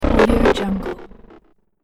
glitch power loss

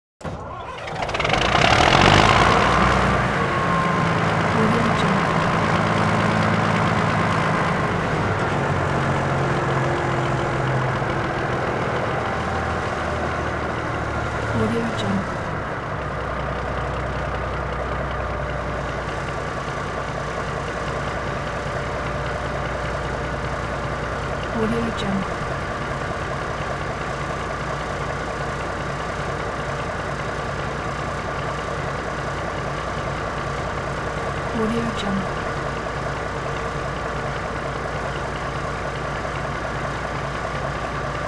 bog cutter starting up and switching off

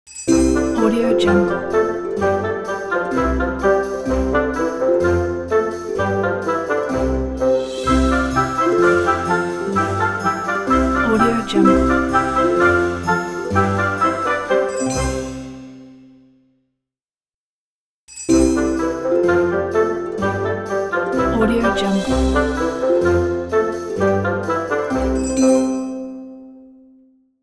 logo for holidays

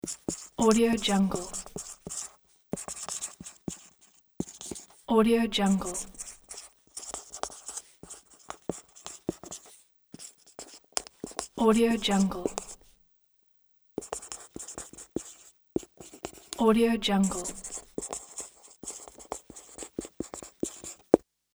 marker writing

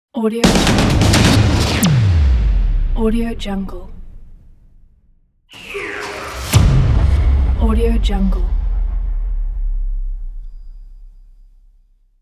trailer sound effects pack

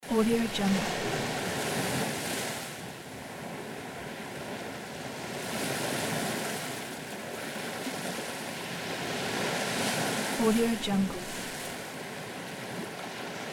ocean waves gentle sand; justsoundeffects

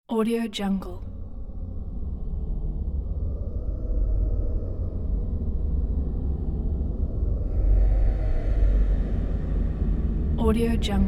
haunted wind factory

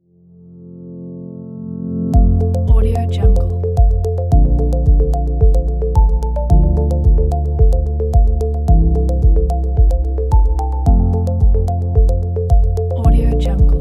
minimal technological ambient

